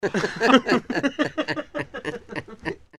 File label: laugh male